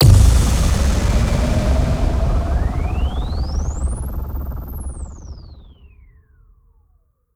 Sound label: FX Impacts; FX Bundle 2; Free FX Bundle